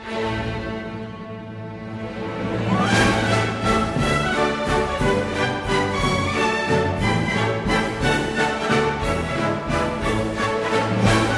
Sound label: Mazurka